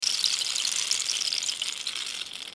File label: Tinkle